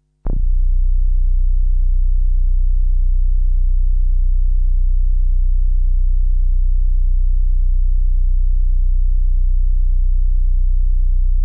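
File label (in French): infragrave nappes statiques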